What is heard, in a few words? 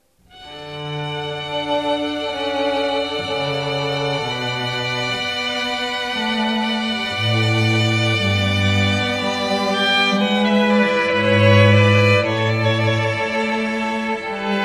Strings